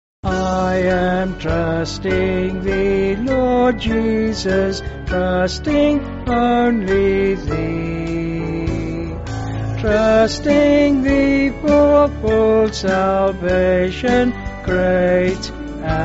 I Am Trusting Thee Lord Jesus, Piano, Band, Pipe Organ; 5/D Vocals and Band; Sung Lyrics